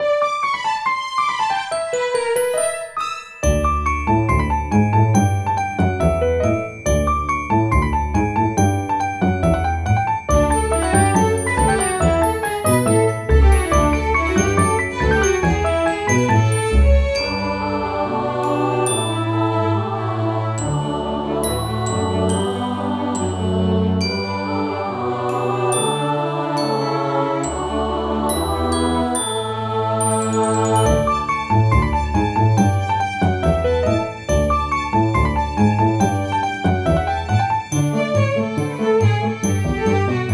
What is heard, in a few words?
Cleaning Phase Uptempo